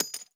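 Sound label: bullet case bounce 50bmg dirt; gmod; viper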